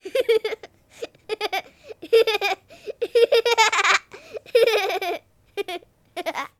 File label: Laugh, Girl, Giggle, Playful; Poetry Project